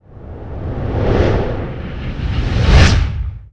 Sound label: Reverse Wind; Title Sequence Effects